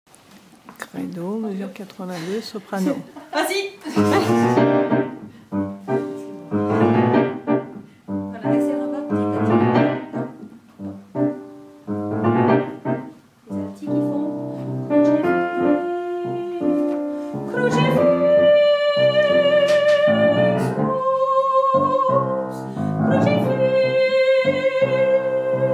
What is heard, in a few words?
soprano; credo